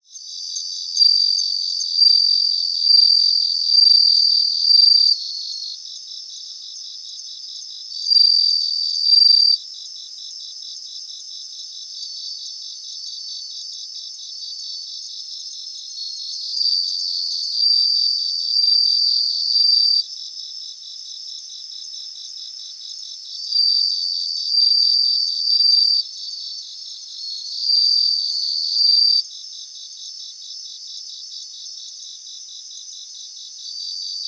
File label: cricket grassland